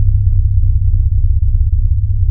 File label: SUBBASS; Q Up Arts; Dream Experience; DANCE